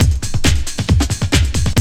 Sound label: Loop, Roland; Sound & Vision - Gigapack I